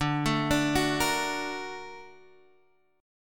A sharp Augmented Major 7th D

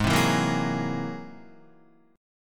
G sharp Augmented 9th, Guitar; G#+9; strummed; 3,3,4,3,6,4; 4F,4A,5C,5G,5C,6E

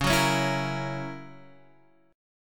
F sharp 7th, guitar

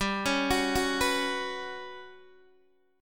G Major 7th Flat 5th, Guitar, Open D

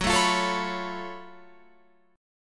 F sharp Minor Major 11th, F sharp, Guitar, Open F; strummed

